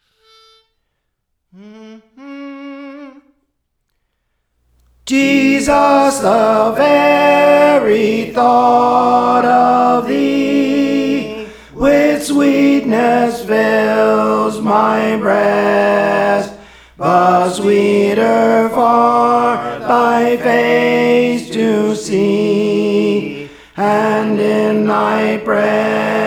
Tenor; Praise For The Lord Training; Jesus the Very Thought of Thee

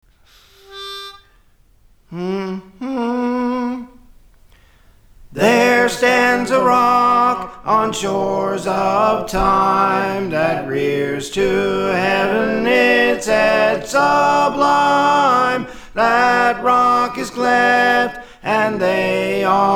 Soprano; There Stands a Rock; Praise For The Lord Training

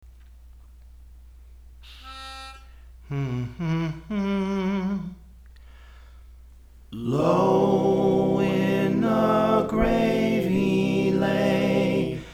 Soprano; Praise For The Lord Training; Low in the Grave He Lay

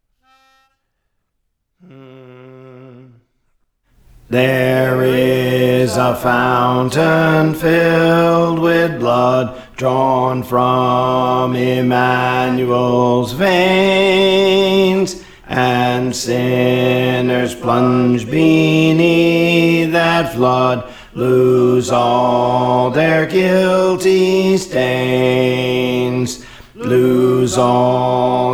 Bass; There Is a Fountain; Praise For The Lord Training

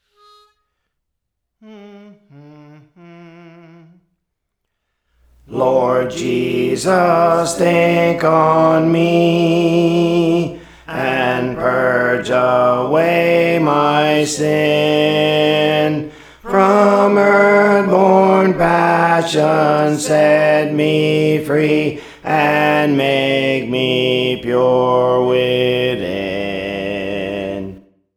Alto, Songs; Praise For The Lord Training; Lord Jesus Think on Me

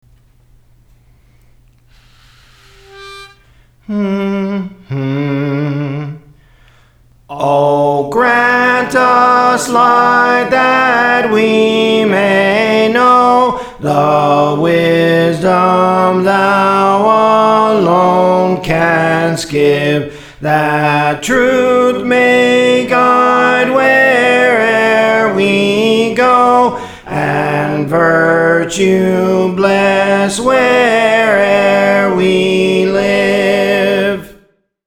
Soprano; Praise For The Lord Training

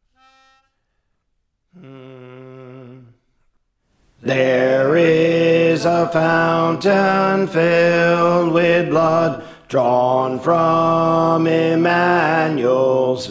Alto; There Is a Fountain; Praise For The Lord Training

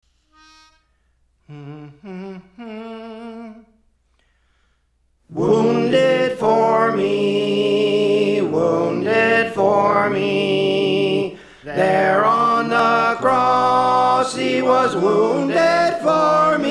Tenor; Praise For The Lord Training

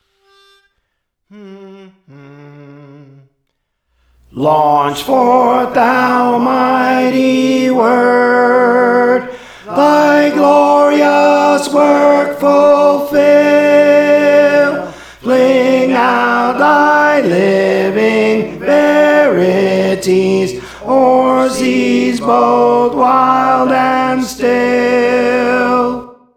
Tenor; Praise For The Lord Training

